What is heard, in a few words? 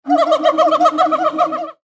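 sounds of monkeys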